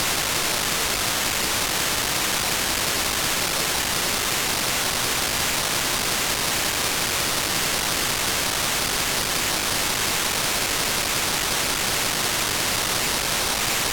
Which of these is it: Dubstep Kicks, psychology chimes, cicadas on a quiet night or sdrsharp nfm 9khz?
sdrsharp nfm 9khz